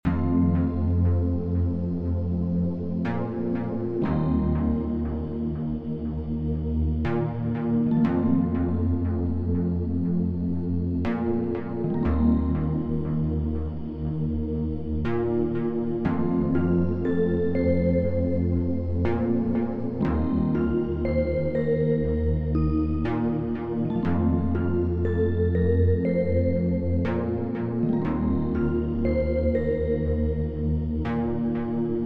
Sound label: low effort